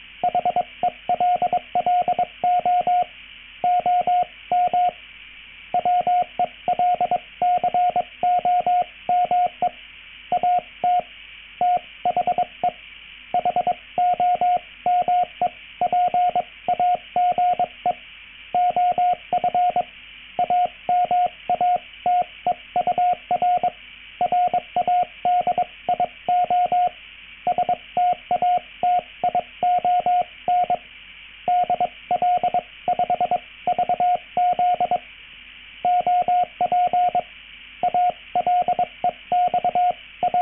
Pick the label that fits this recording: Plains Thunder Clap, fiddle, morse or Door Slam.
morse